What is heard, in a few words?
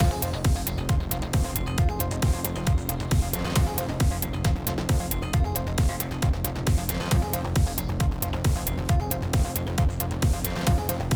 Alert State 8CH